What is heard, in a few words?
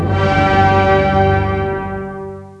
CLASSIC, CLASS.HIT; East West; Global Sounds